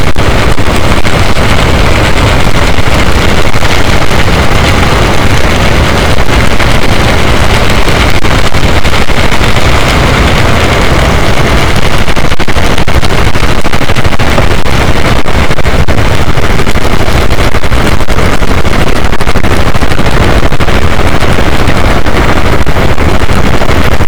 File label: cachalot, boat; Physeter Macrocephalus; SNR 24dB